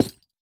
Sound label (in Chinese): 樱花木悬挂告示牌破坏声